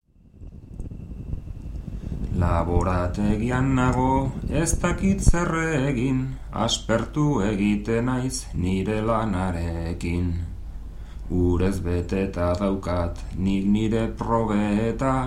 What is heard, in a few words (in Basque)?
bertsos